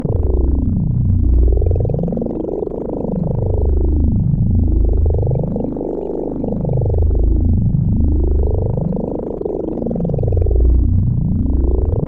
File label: Ominous